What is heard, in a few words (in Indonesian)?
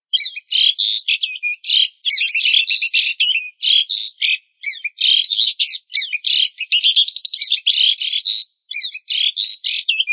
suara burung jalak suren atau uren